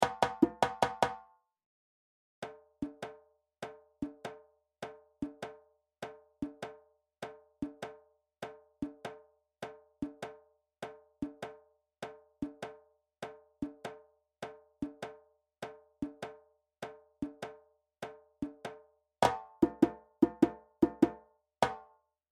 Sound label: Djembe